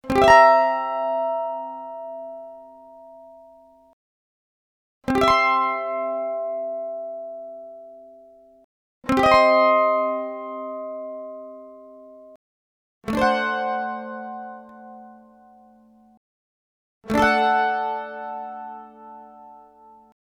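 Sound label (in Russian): звуки мандолина, mandolin chord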